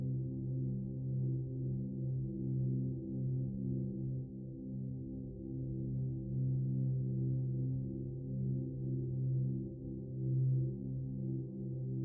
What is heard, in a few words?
Background